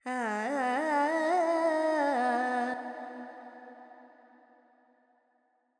FX Phrygian, Carnatic Female Alaps; Sample Banks

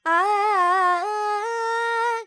Pentatonic, Vocals, Carnatic Female, Alaps, Dry; 70 G; Sample Banks

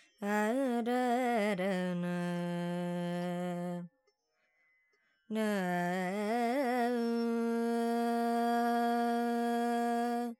Dorian, Vocals Carnatic Female Alaps 70 G Dry

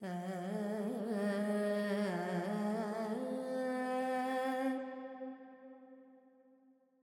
Carnatic Female Alaps, Purvi Kalyani, 100 G, Verb; Sample Banks

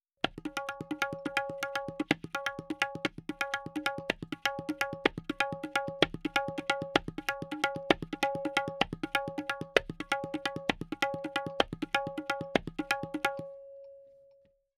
Tabla C 4-4 128, Percussion; Sample Banks

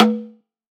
Perc; Sample Banks; Percussion

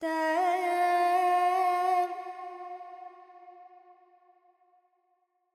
FX Dorian, Vocals, Carnatic Female, Alaps, 70 G

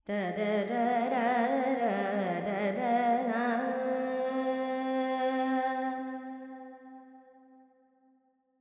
Carnatic Female Alaps, Lydian, 70 G, Verb; Vocals; Sample Banks

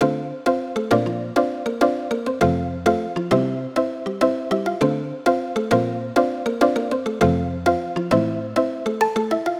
Pop Tropical Dm 100, keyboards; Sample Banks; Melody

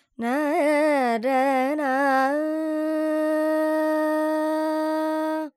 Lydian, Vocals, Carnatic Female, Alaps, Dry; Sample Banks

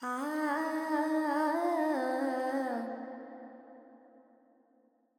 Pentatonic, Vocals Carnatic Female Alaps 70 G

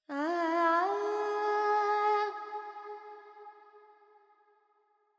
FX, Vocals, Carnatic Female, Alaps, Charukesi

